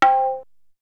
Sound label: Perc; ZERO G - Datafile DISC 1